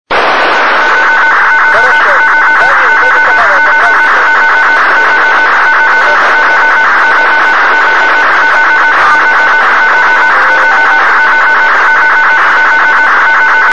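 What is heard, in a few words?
strannij signal, strange signal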